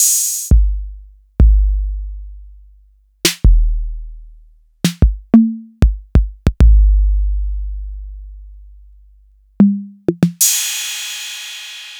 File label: TR-808; Sliced